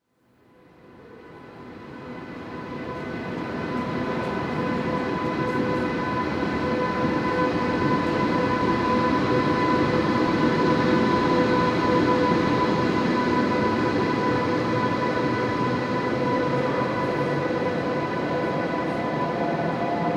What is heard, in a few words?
train passing in trainstation